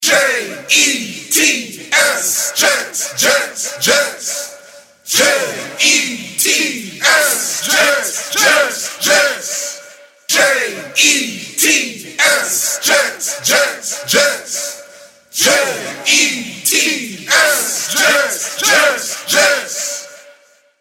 Cheer; Ny Jets

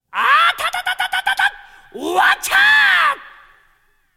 Crack Fist

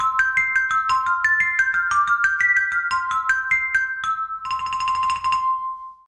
Soothing

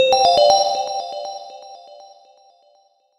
Cute Sms

